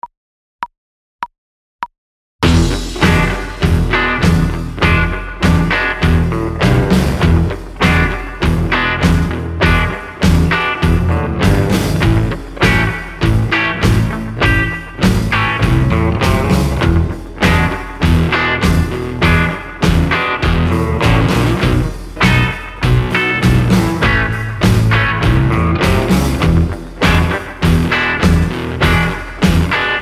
Blues 100bpm Backing Track

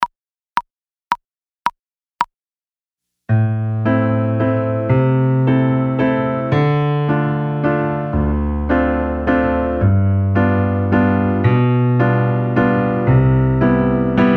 110bpm Backing Track

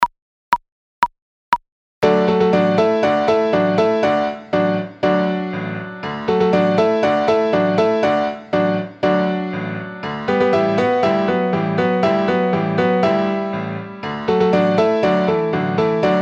120 bpm Backing Track

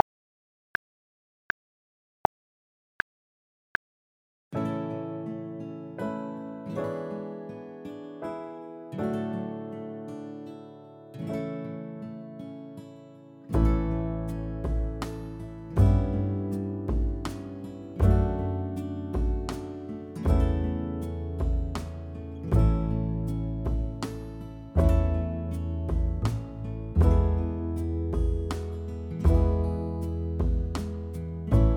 80 bpm Backing Track

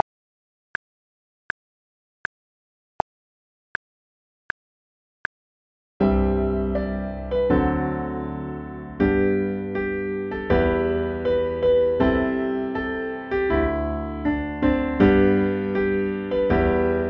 80BPM Backing Track

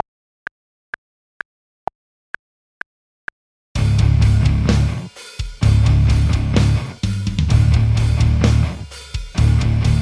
128BPM Backing Track

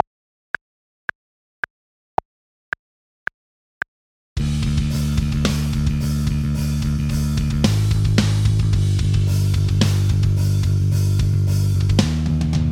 Power Chords 110BPM Backing Track

